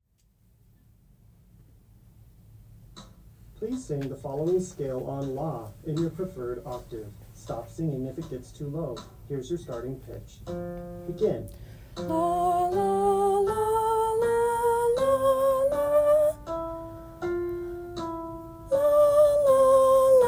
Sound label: alto; Audition